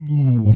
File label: ghoul attack